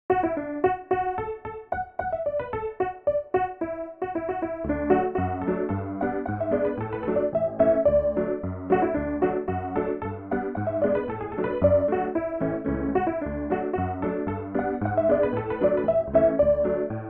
Folk Piano 4 Hands; Sherlock Holmes The Awakened

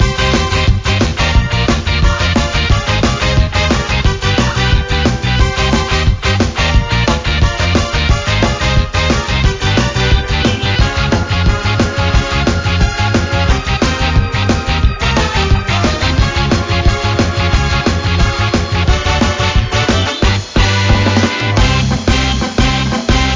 bgm guild battle battle loop

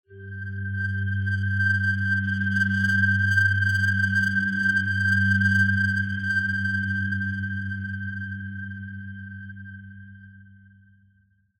Atmospheric Mystery Signal

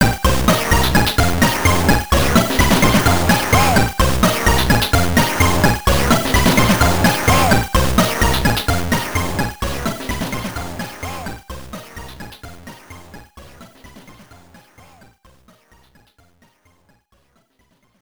Chords, Carillon; carillon player v1.0 demos; gb

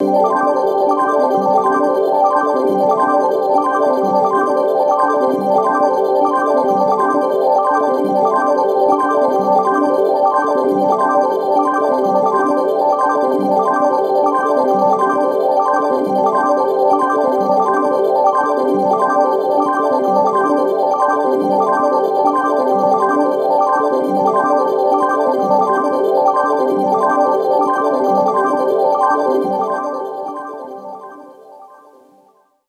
Boss Ambience

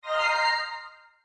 Menu Custom Stat Increase

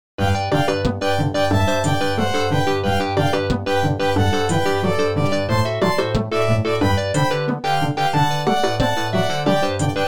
Stage Forest; Athena The Music

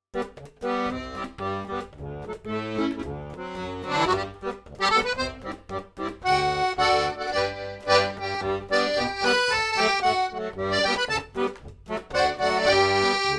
Accordion; Assassin's Creed Syndicate

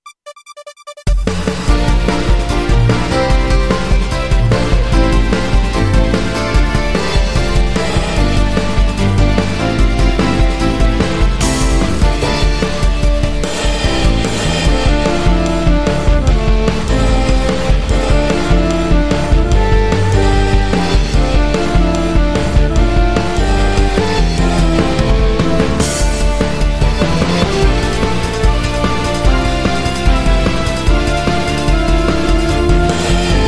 music green rain; Bluest Elements; Android

